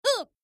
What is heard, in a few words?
Small Object Place; super stacker 2 online